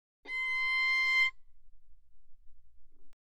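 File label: Violin arco fortissimo sul D C6; Strings; violin2012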